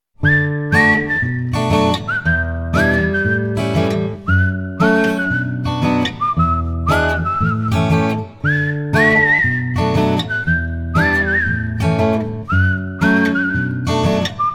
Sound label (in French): Siffleur